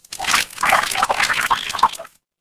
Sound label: water swirl